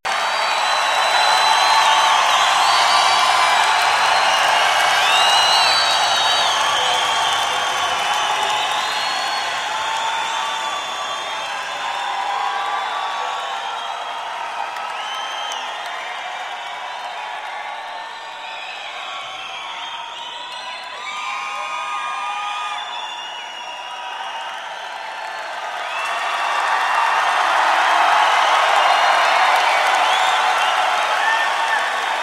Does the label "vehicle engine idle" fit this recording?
No